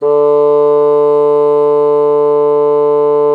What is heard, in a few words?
bassoon D3; Xsample VOL-6